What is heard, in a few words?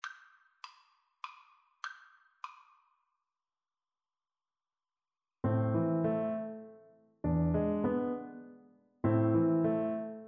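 recorder karaoke; beethoven fur elise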